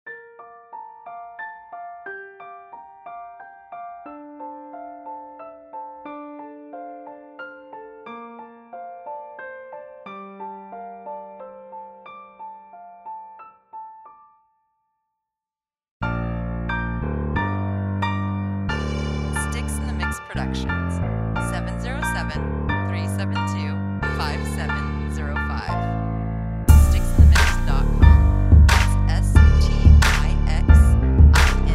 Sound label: Piano